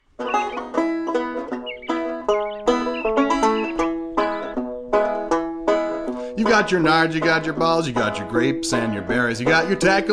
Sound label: SING A LONG